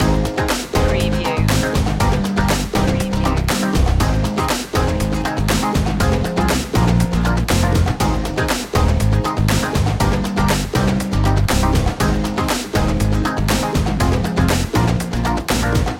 flowing rhythm loop

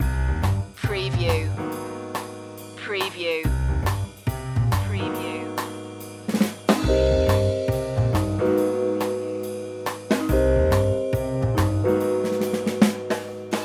blue swing loop

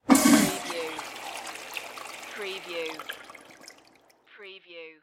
Urinal Flush Public

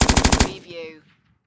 Machine Gun PKM

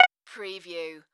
Multimedia Beep

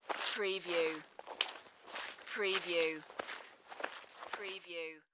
Footstep Mud Walk

